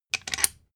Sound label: thread screw remove